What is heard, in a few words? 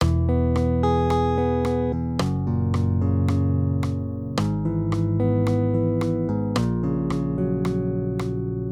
maj triads